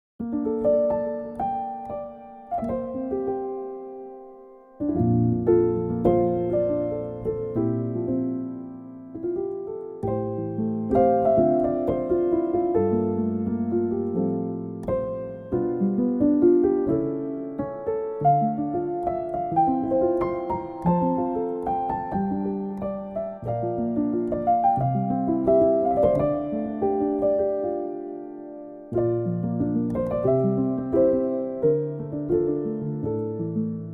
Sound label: singing birds; weeklybeats 2024